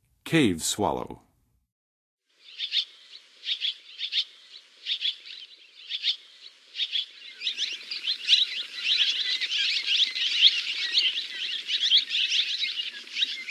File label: Cave Swallow, Songs of Birds; North America; Western USA; Western USA Songbirds